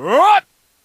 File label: jump; superheros; captain america